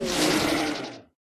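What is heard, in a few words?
pterodactyl boss wound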